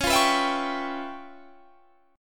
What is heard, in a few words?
C sharp 7th Sharp 9th Flat 5th C sharp Guitar Standard; strummed; C#7#9b5; 21,20,21,21,20,21; JGuitar